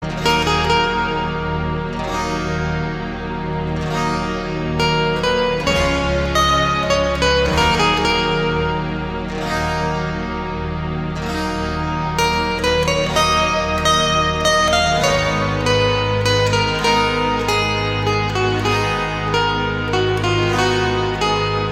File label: Guitar